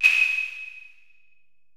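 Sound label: 808 clave; Wizoo - Platinum 24 Electronic; 480L MP 808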